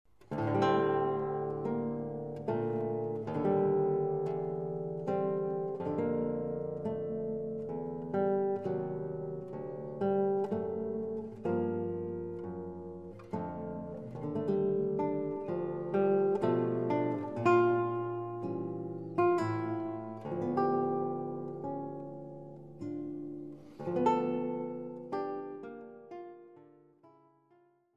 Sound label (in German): Gitarre